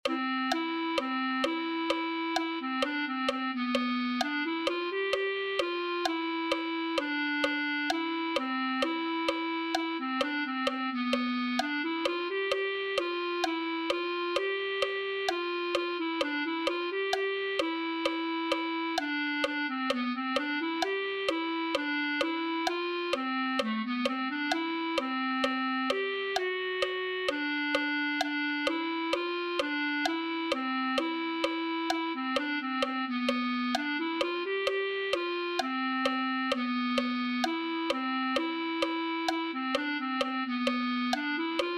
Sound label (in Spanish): clarinet1